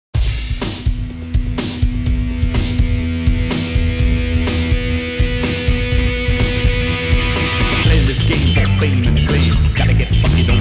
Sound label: Rocket Funk; Total Distortion